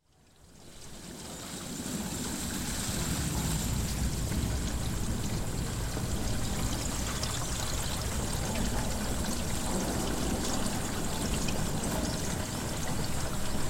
Silos de água; Póvoa das Leiras

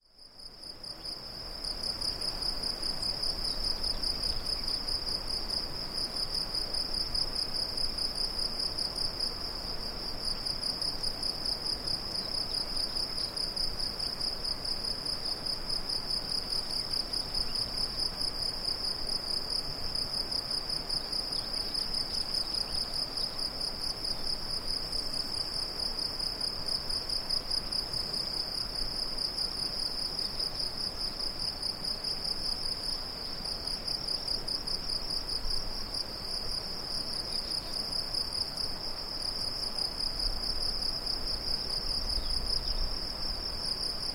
Cigarras matinais; Fujaco

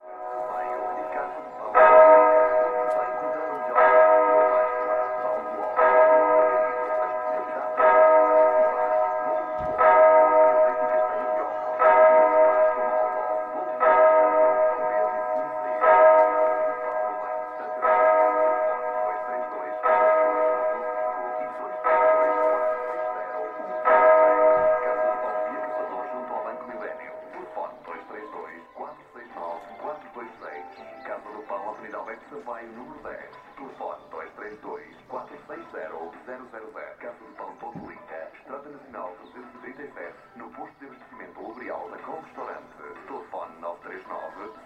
Sino da capela com publicidade; Mosteirinho

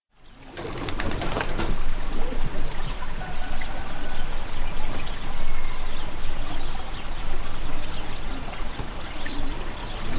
Arrulhar de pombos; Rompecilha

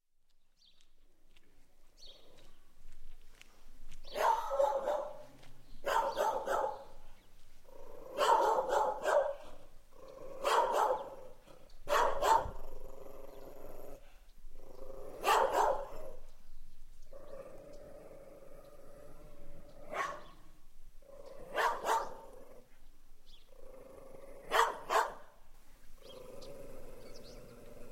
Cães ladram; Coelheira

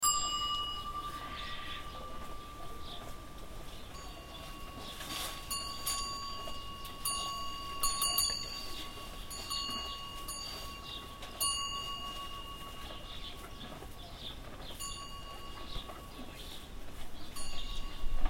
Tilintar de chocalho; Candal

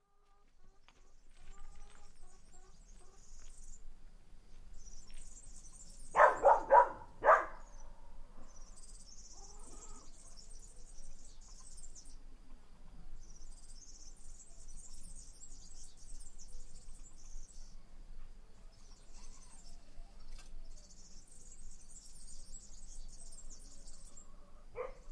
Rolas cães e pássaros; Leirados

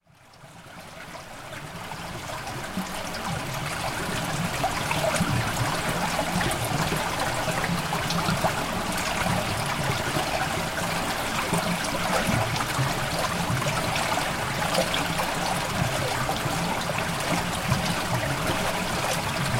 Rego de água à beira da estrada; Aldeia

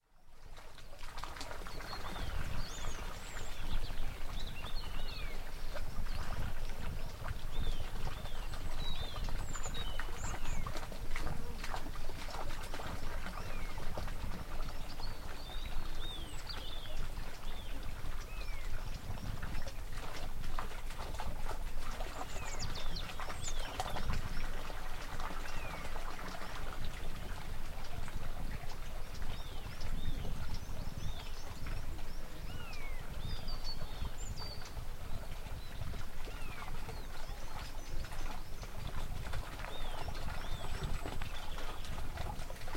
Água pássaros e vespas

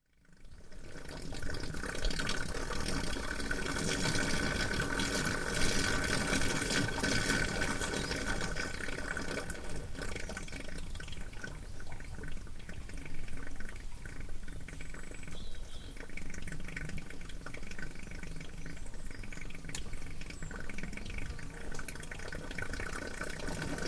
Gruta de água borbulhante, binaural

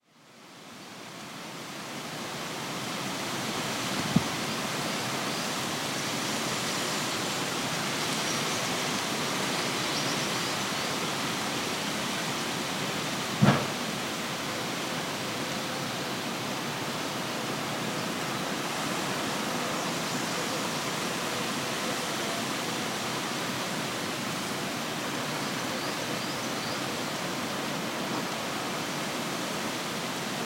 A corrente do Rio; Pereiró

